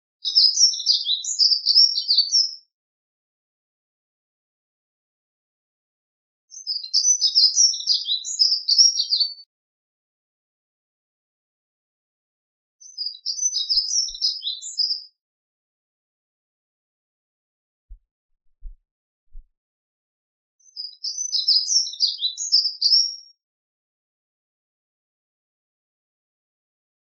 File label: Prunella modularis